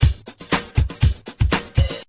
flat 120, drums

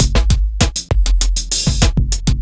low 99, drums; Loopasonic

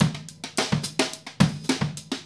live, drums; Loopasonic

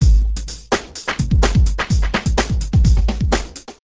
funky breakbeat

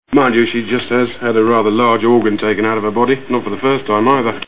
organ; She just has had a rather large organ taken out of her body...

